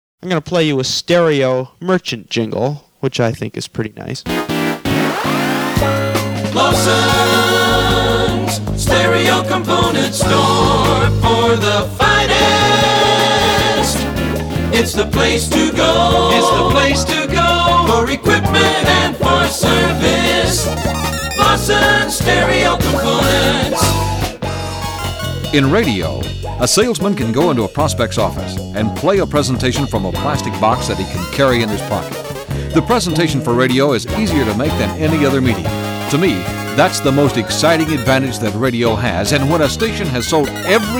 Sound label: Stereo Merchant Jingle; PAMS 806